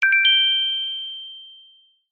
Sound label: doorbell ringtone